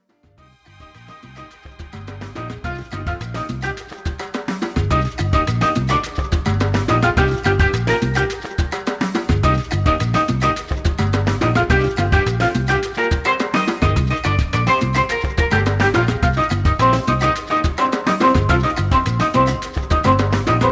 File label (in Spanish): Musica Latina Danza Latina